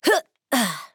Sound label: grunt jump